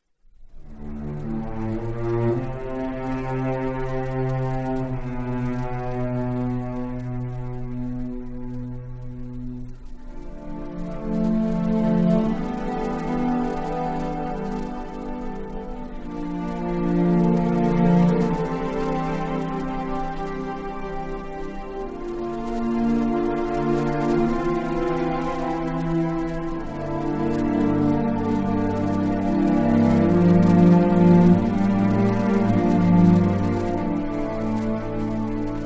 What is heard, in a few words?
Instrumental